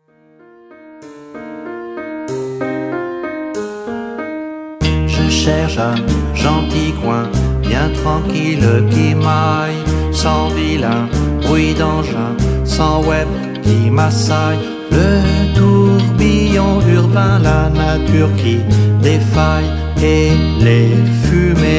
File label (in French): Chant